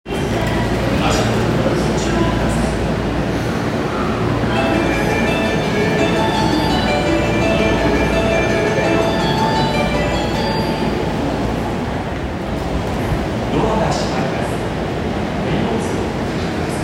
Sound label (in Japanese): 有楽町線池袋西, train sounds